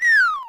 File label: Lakitu Throw